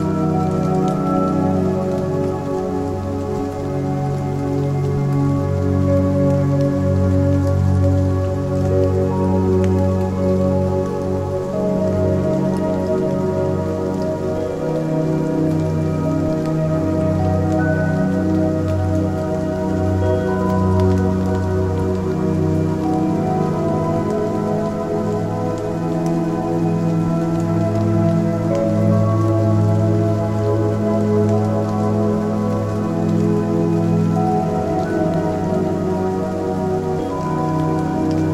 Rain cabin sound in nature in helps in relaxation of body and mind Zen Music